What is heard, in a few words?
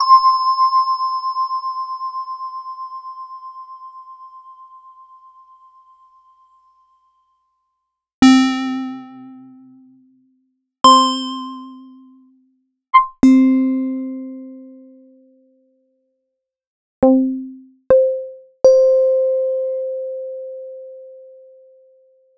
Yamaha TX7 TX81Z Keys